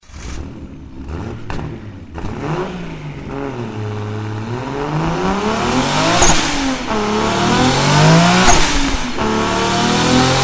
Ford Mustang

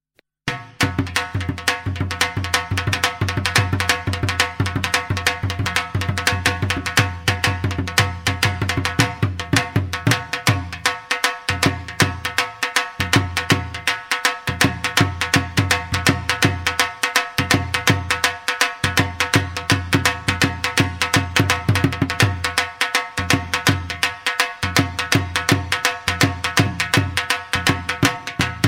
Ddhol Bhangra; meloboom

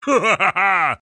Laughter

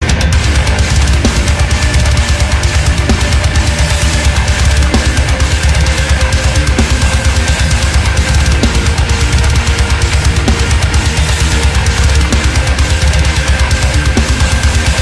Metal Ringtone

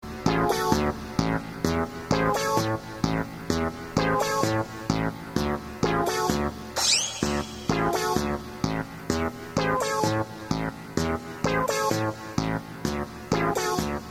Bouncy songs

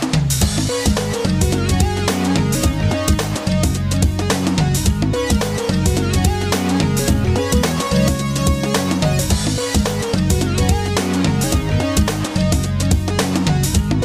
Jazz Menu Music

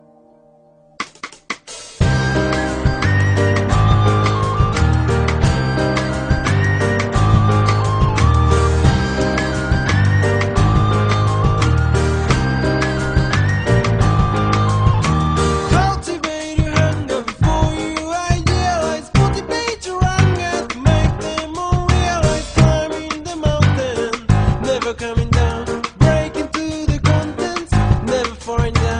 Wind

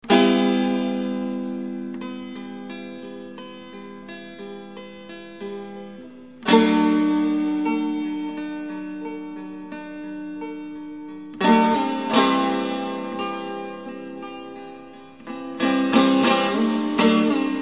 Baglama

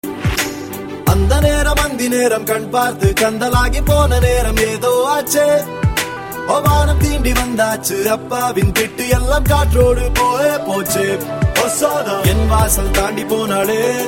Tamil

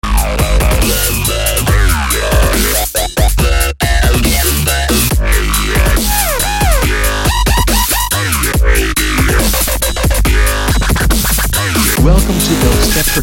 Dubstep notify

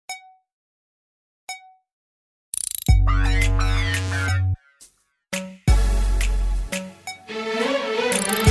Cool Sms

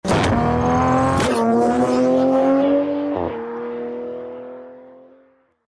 Supra MKV Blow off

